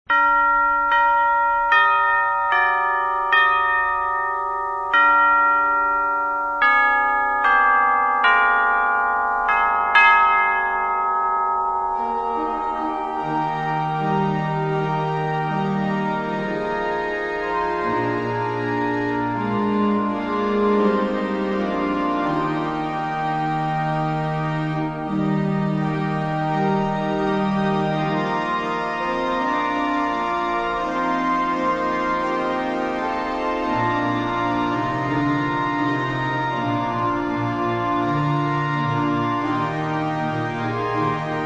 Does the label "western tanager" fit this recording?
No